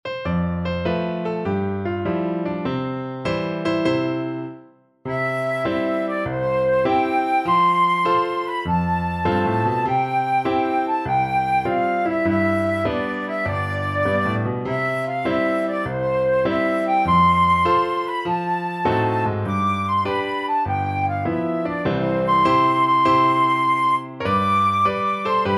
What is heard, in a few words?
flute